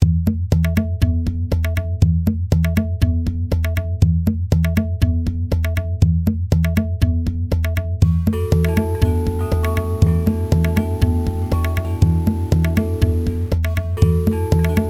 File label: drone